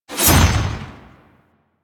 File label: ninja glaive; Warframe; Warframes